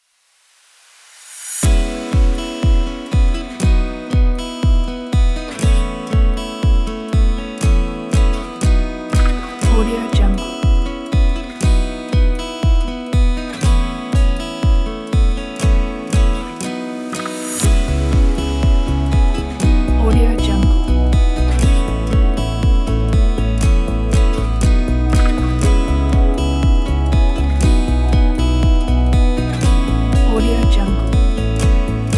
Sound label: chill guitar